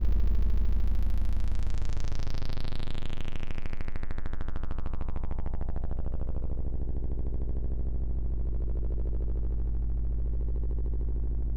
deep bass

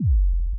kickdrum

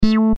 tb 303

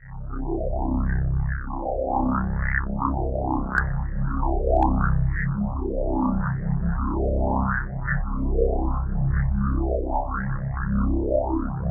fx synth

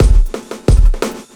jungle beat

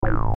bass, roland juno bass

